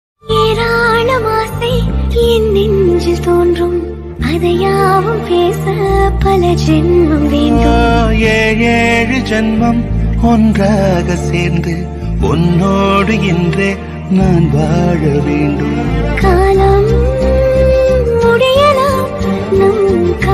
song; nayanthara; cute

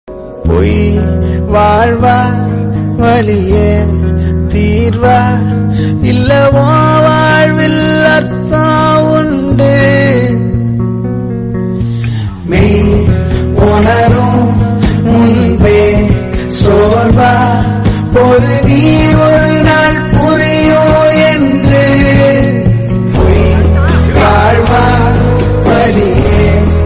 tamil sad ringtones

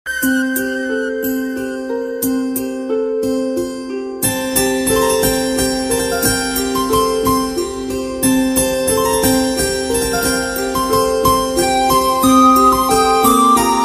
tamil sad bgm; appa bgm

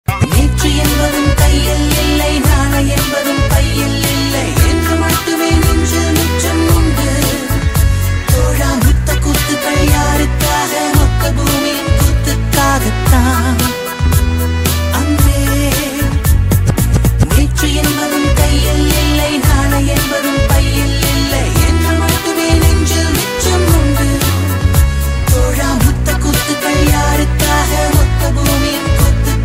tamil songs; dance

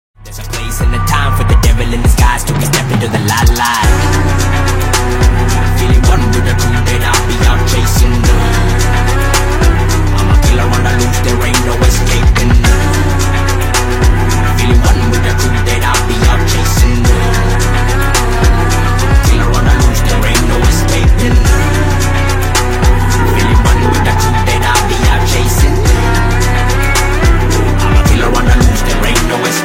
song bgm ringtone; rifile club

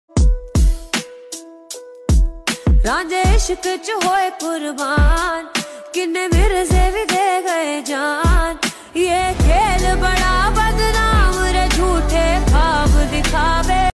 song ringtone

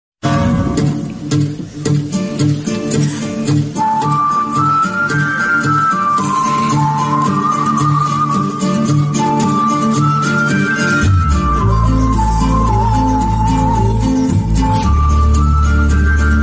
flute bgm ringtone; mahesh babu business man movie

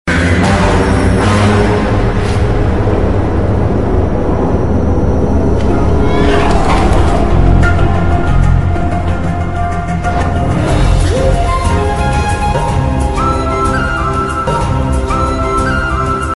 trailer bgm; virupaksha movie

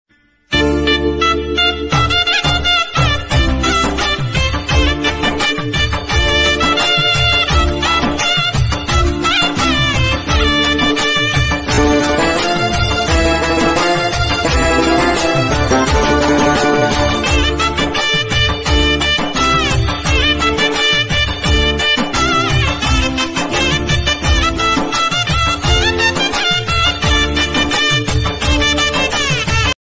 bgm ringtone; ayyappan; tamil ringtone

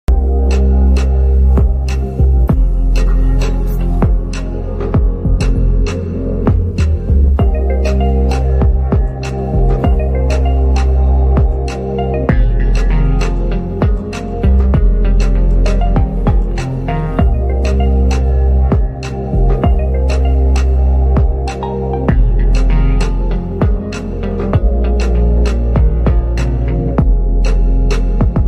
background music

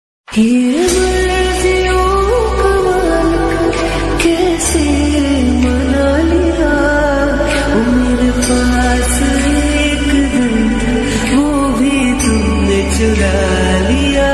best romantic love ringtones hindi ringtone

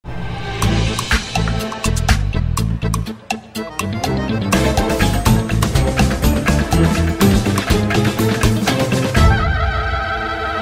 funny bgm

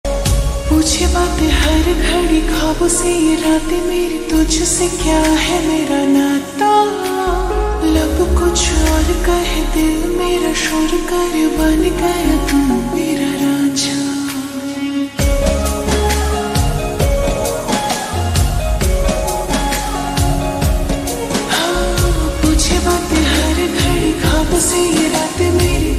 lofi song ringtone mix ringtone

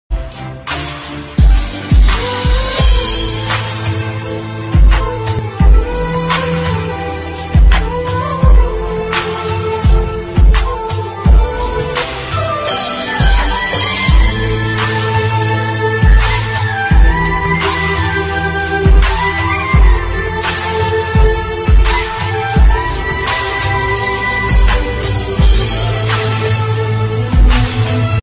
bgm instrumental ringtone

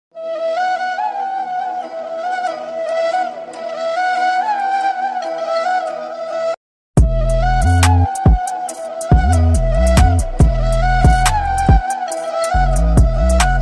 bgm love bgm remix bgm ringtone

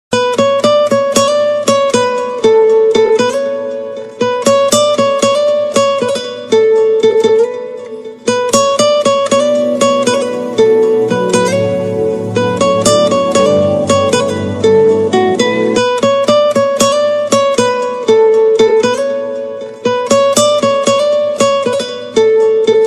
love ringtone bangla ringtone

